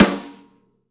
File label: Rock Snare, Roland BK-5; Snares